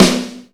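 perfect snare b key, steel snare drum tuned to the B note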